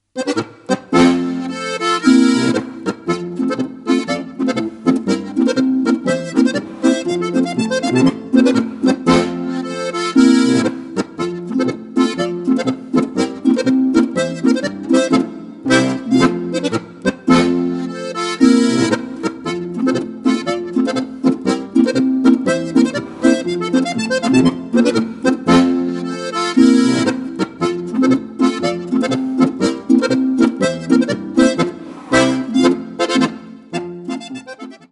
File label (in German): Harmonika